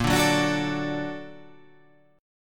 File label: A sharp Augmented Major 7th A sharp, guitar